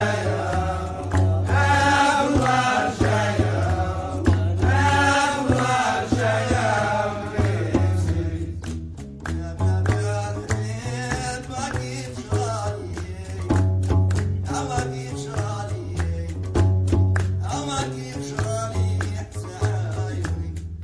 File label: old moroccan ringtone